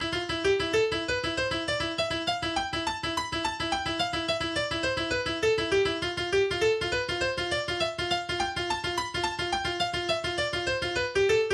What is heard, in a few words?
Noisy Piano Man; This is a song that I made with this free software called TinyPiano